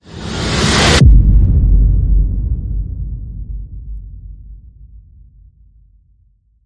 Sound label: Boom Whoosh